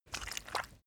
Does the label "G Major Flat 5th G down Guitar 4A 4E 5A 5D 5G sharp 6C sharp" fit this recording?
No